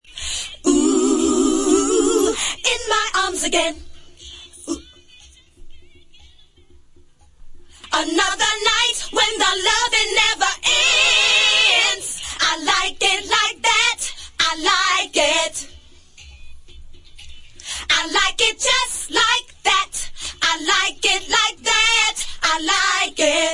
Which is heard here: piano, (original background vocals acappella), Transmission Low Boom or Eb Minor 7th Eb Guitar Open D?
(original background vocals acappella)